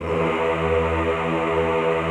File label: EHH E1, Classical Choir; Best Service